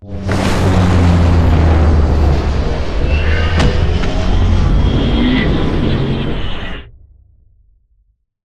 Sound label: strafe close; Beacon; Titanfall 2